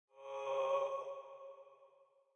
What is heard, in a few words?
soulspeed; minecraft; enchant